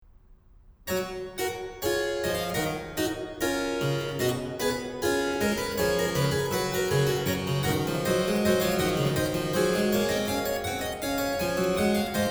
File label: Harpsichord; Bach; French Suite 5; BWV 816; Gavotte